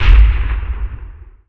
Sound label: heavy shell distant; Weapons; ekgunnery; EK modern; Barotrauma